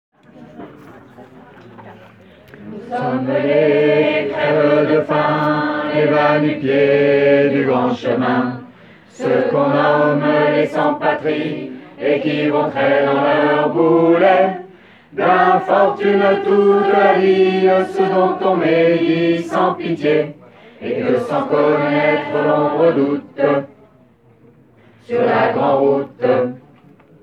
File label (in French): tutti 3 couplets